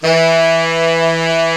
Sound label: SAX E2; Giga Samples Collection; SAX STAX 4